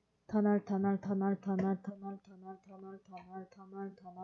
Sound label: tunnel